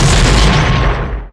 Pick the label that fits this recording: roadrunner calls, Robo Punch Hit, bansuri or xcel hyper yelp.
Robo Punch Hit